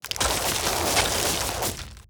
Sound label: Ice Barrage; Spells; Free Fantasy SFX Pack By TomMusic